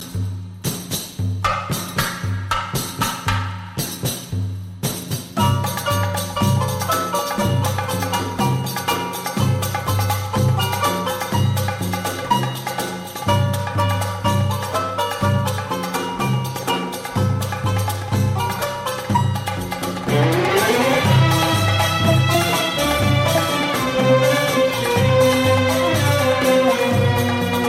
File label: music